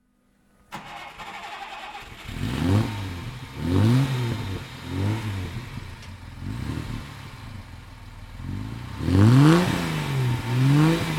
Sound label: Mercedes-Benz 220 SE; Einspritzluxus